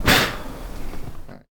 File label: Fire Staff, Fire sound effects